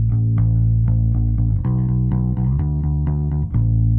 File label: bass; musdb18